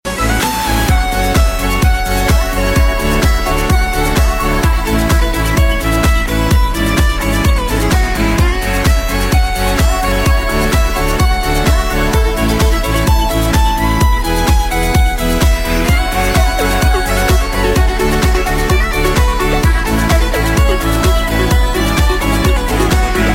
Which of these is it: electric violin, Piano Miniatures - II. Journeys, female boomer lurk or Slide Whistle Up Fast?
electric violin